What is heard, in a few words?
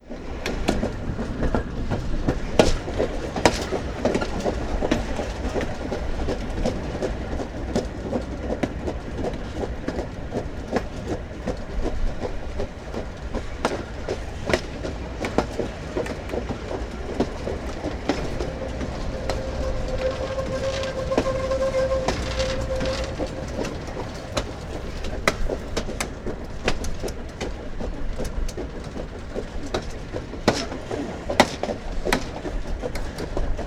Train